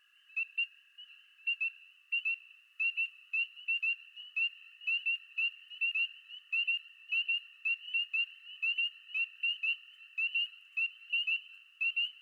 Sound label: Spring Peeper